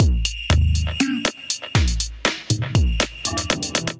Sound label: Kit, drums; Ozark Glitter Kit; Ableton; Skitter and Step